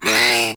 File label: Kavat Vocal Pain; Warframe; Khora